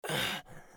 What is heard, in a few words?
bear wound; eft